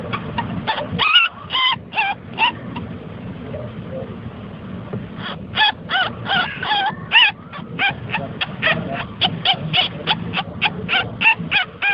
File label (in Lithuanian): kalba seskiukai spenelio, ferret